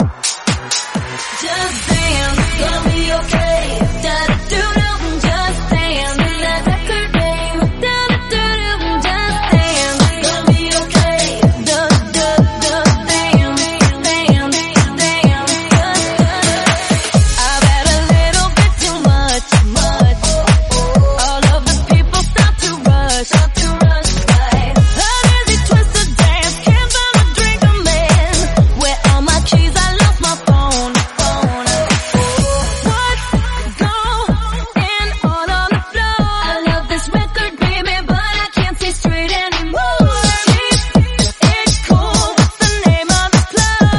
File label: 126bpm Dbm